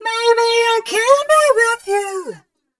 f mel c, fem Vocals

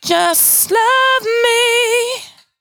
92bpm Bb, Velvet Vocal; Zero-G

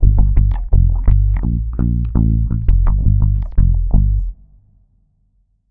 Dub Bass, Electric Bass

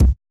kick, Roland MC-909

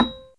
HAMMER, DX RHODES; Best Service Advanced Media Trax Modern Composer

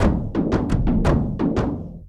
Frame End, Kendang; Sonic Foundry (Sony Creative Software) - Friendly Fire; Drums

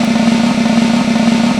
SNAROLL, ORCHESTRA

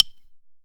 WOODSTICK; Best Service; World Colours

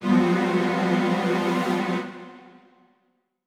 orch sting; musicradar; cartoon caper

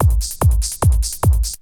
Bite Size Beats

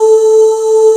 Oohs A3; Proteus 2000; Digital Sound Factory Proteus 2000

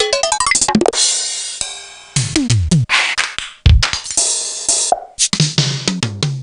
Technics AX5

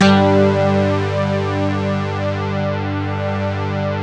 SYN, SYN Analog; Sound & Vision - Gigapack I CD 2 (Roland)

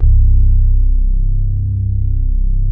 Dance Floor Bass, Multisample Basses

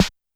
Snare Analog L, YAMAHA RM1X

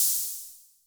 bs2 hihat, Novation Bass-Station-2, Drum Machines

